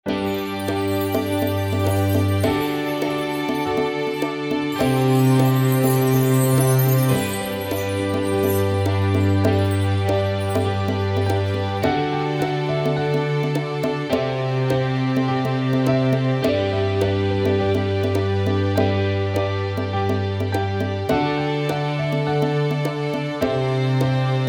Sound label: Instrumental; Glen Falls